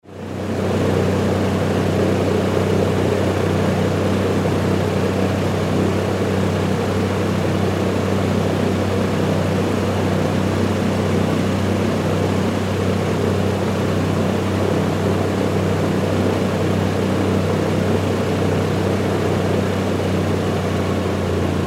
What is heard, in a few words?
Air Boat Running On Water; Transportation Watercraft; Boat Sound Effects; Boats (Sound Effects:Vehicles); Blastwave